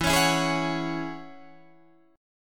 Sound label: E 6th Add 9th E down Guitar Open D